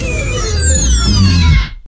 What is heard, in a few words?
machine